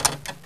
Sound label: Switch On